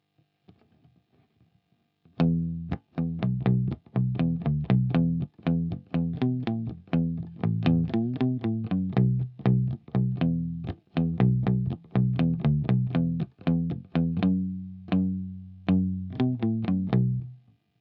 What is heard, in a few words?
retro bass